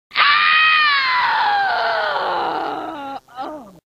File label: scream; sonya blade umk3